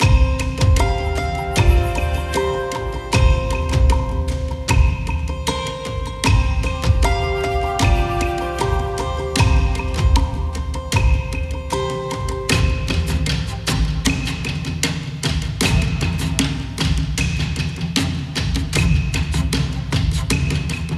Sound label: Ambient Night; Eidolon; Cetus